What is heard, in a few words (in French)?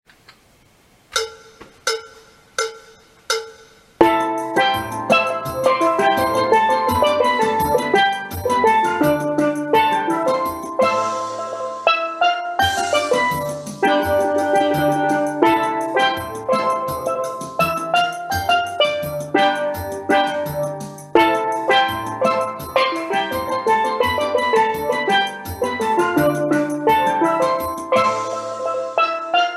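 TENOR PHRASES TUTTI, Steel Band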